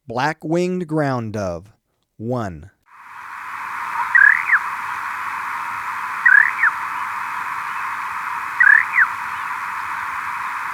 Black-Winged Ground-Dove; S. America

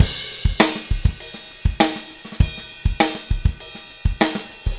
Funkified, Rock Drums

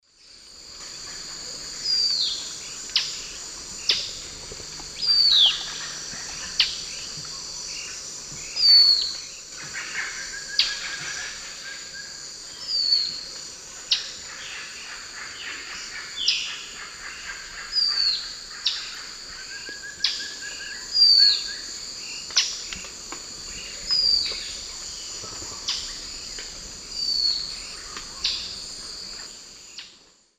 Pygiptila stellaris, call; S. America; Eastern Lowlands

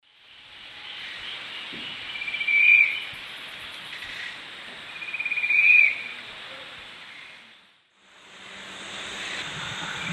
Pygiptila stellaris, song; S. America; Eastern Lowlands

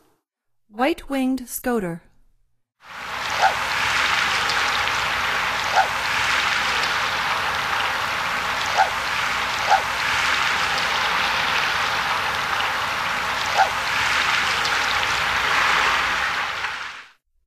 White winged Scoter; North America; Stokes

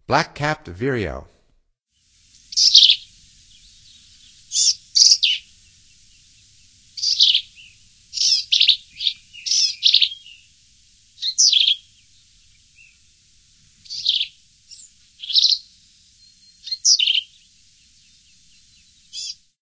Black-capped Vireo; North America; Stokes

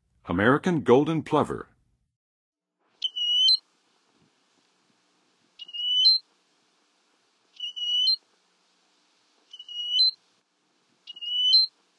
American Golden-plover; North America; Western USA; Western USA Songbirds

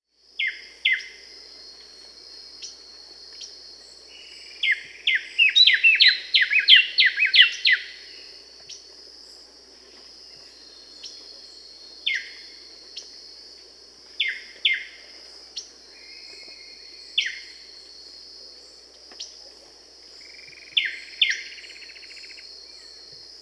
Buff-breasted Wren (Thryothorus leucotis), Peru; S. America

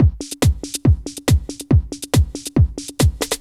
techno 140

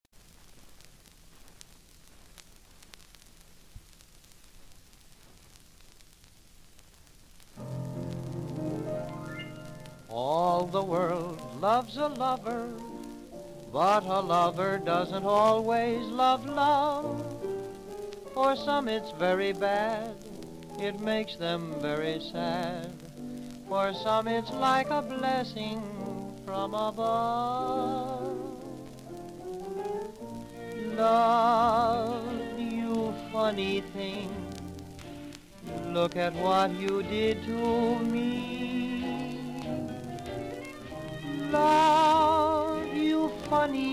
Society Orchestra, vocal; Popular Jazz Archive 1920-1936

